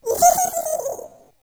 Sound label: HalloweenGhost Die; Eft